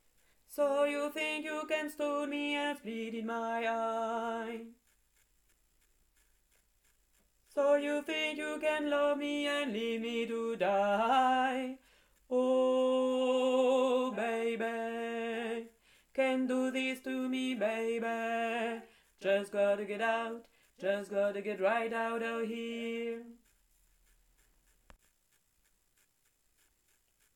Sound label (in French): tenori